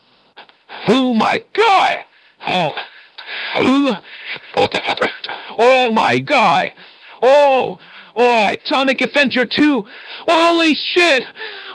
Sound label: vocals; sanic